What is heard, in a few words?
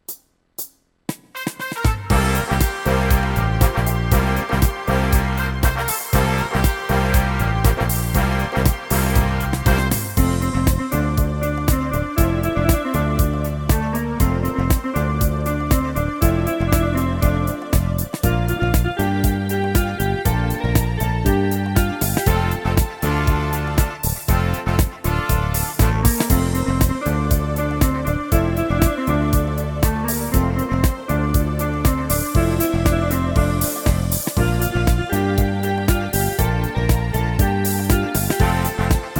disco